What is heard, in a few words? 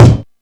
thumpy kick drum sound a sharp key; kick drum samples